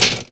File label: TYPE